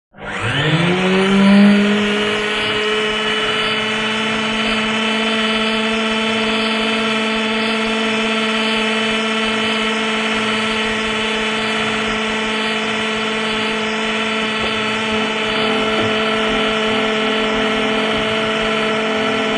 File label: Food Mixer; Food Mixer Start, Run And Stop.